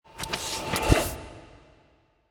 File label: paytable hidden; goldengrimoire